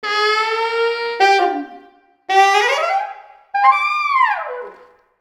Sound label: Musical sax solo; lego star wars the force awakens 2016 ps4